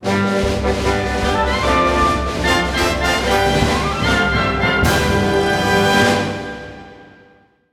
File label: Victory Fanfare; Pokémon; Super Smash Bros. Brawl